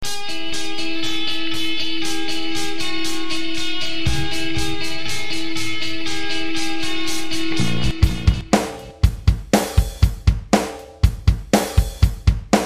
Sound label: Instrumental